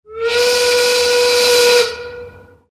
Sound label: whistle; basketball; pistons